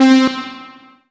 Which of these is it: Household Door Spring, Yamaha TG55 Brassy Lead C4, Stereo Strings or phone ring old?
Yamaha TG55 Brassy Lead C4